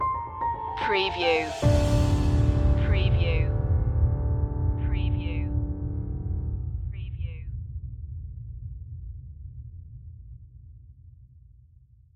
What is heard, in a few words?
gothic trailer hero stinger